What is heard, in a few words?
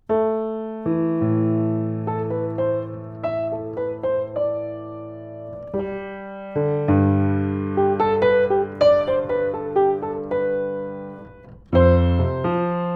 Piano; Rode NT1-A Microphone; SHAFER & SONS BABY GRAND